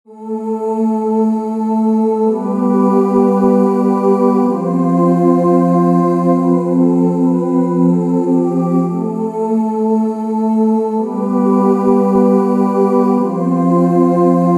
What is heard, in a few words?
simple ohh; Arturia V Collection 9